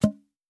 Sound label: Human Hunter Pop; Legend of Keepers